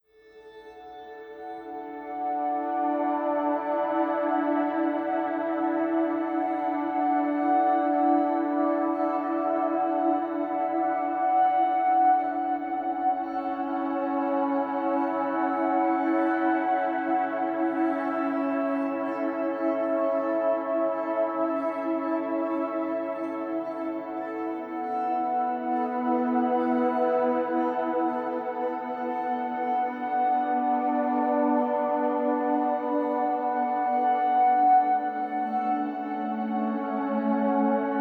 Spa Music Zen Meditation Natural White Noise New Age